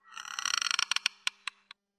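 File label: doll turns head creak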